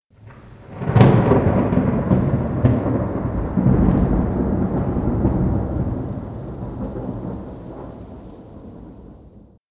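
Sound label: thunder on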